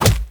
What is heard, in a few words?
Melee Impact Punch; Warframe; Purgatory Warrior